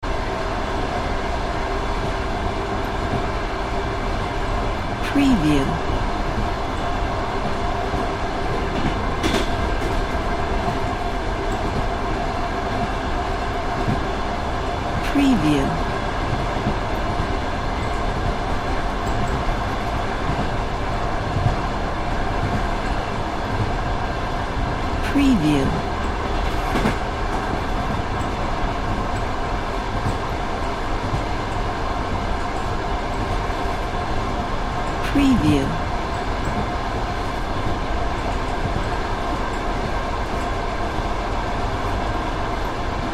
suburban train ambiance

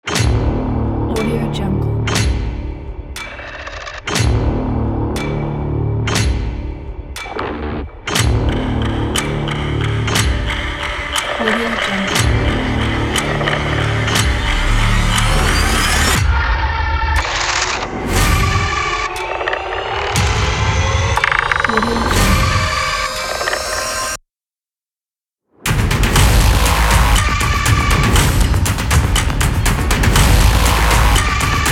horror

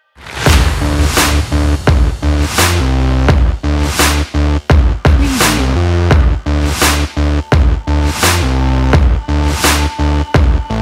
promo

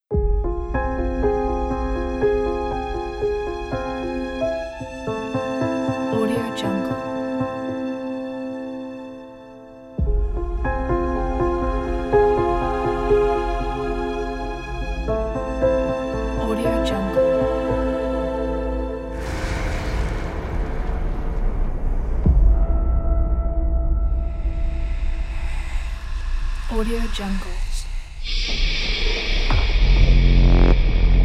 horror movie trailer

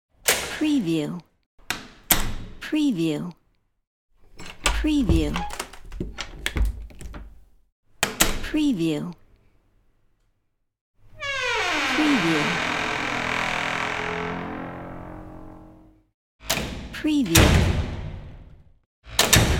the door pack

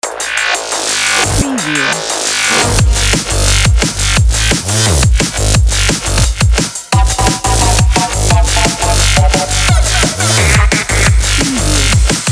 dnb opener logo

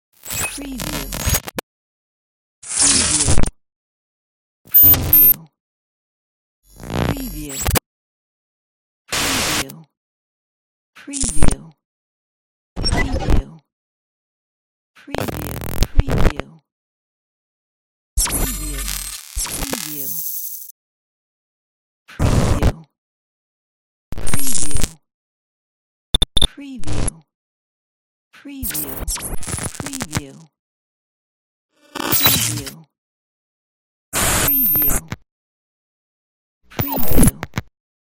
glitches

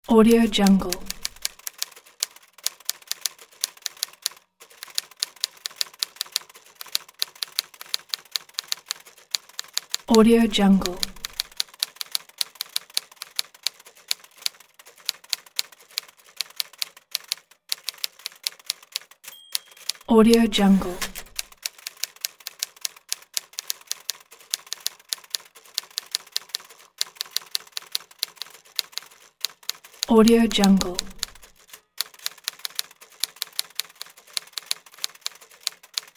typing on a typewriter

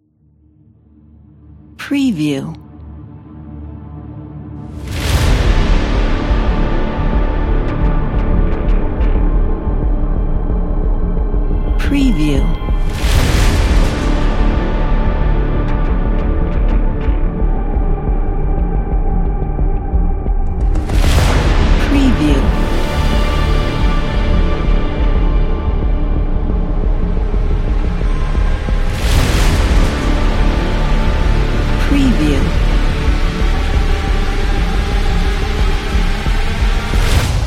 teaser hybrid

